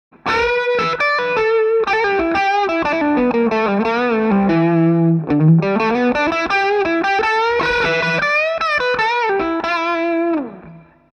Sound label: Cardioid Crunch Solo